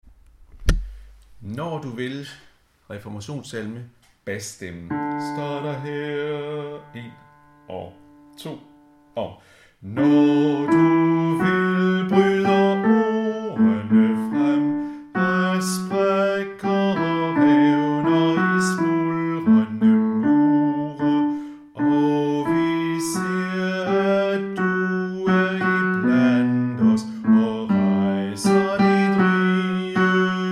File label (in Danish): bas; Reformationssalme